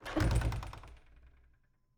Cabin, front door, split door, oak, large, iron fittings, open, wide; Eiravaein Works; Vaeyan IV